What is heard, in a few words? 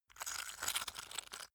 stone scrape; natur-tiere; steine